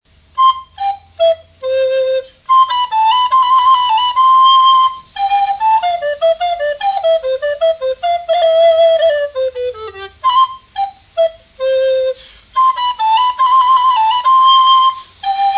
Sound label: alto, recorders